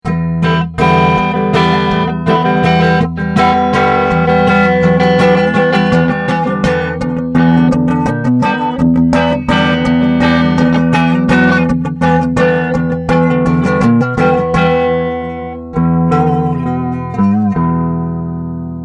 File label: filter matrix